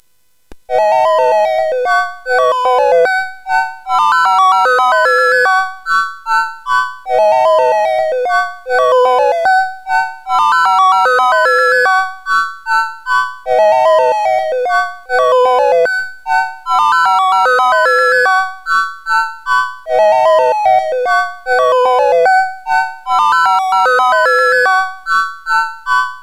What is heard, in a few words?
sid, CSG8580R5; Utter Ego Demo